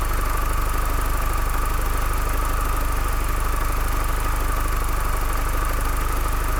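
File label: cooper s engine left; mcs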